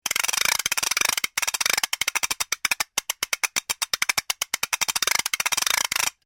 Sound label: NOISEMAKER WOODEN TURN LONG, Cheap percussion toys